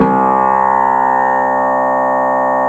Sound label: Sitar C3, Fairlight CMI IIe; Guitars